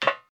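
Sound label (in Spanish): Zapato tacon metal, heel shoe on metal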